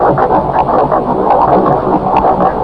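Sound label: weird machine ambience; hl2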